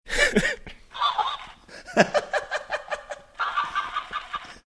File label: talking hamster toy, laughing